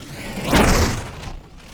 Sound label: wooshes transform sweetener